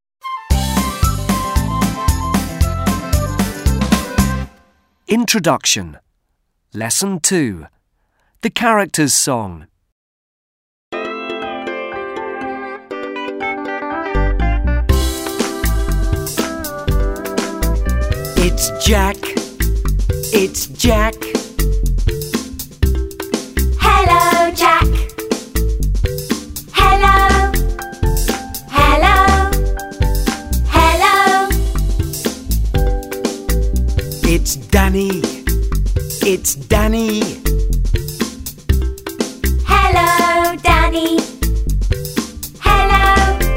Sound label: song